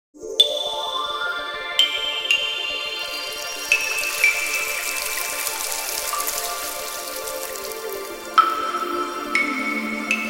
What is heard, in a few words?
Nokia Tones; Bourne; Windows Phone apps